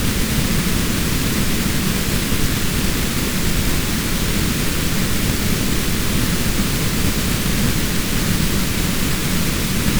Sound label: white processed